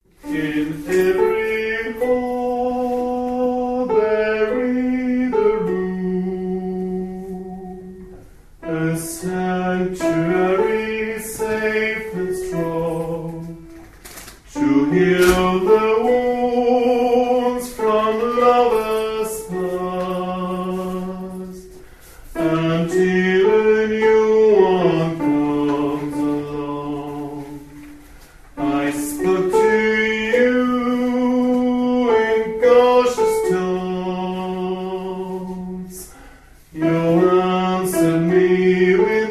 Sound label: Soprani